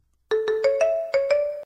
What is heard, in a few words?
newmail